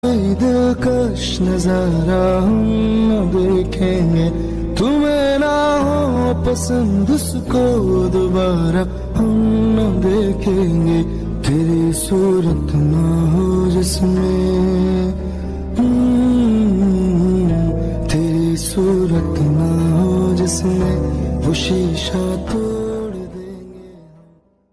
sad ringtone

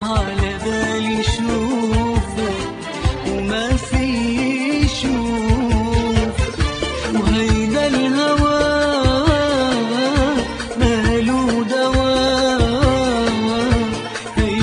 arabic ringtone

